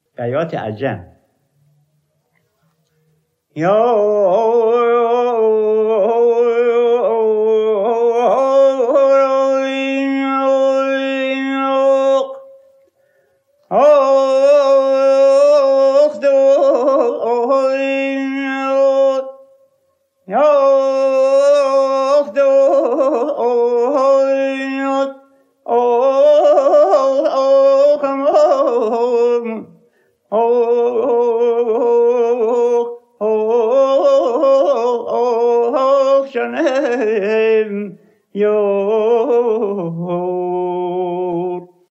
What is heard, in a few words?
bayat ajam, rast panjghah